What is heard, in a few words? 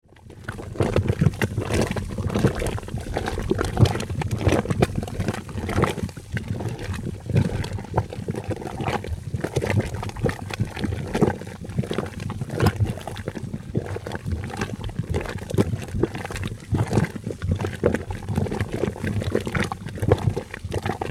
falls mudpot; fairy